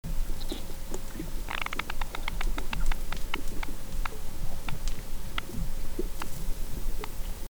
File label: Fish Farts of the Kennebec; soniferous